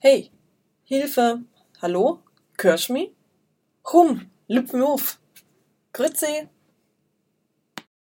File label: Waiting For Game Start